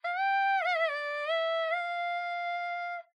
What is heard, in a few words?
Kulning